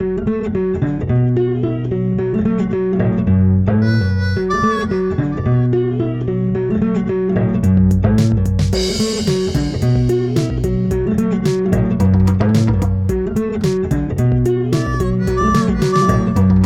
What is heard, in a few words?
Blues Cigar Box